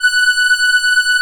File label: ATMO PADS; Best Service; XX Large - Pads